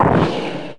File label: fall; fight